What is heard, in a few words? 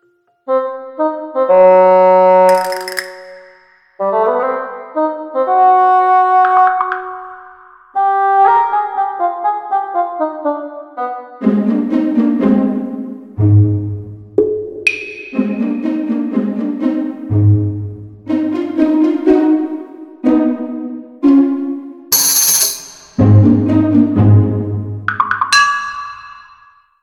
Step, Comic Slow